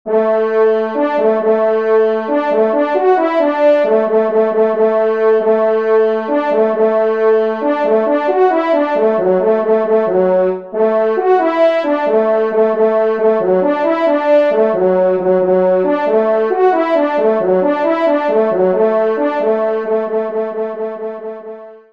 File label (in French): Rondo, trompe; Les Loisirs du Chasseur n°10; Henri Sénée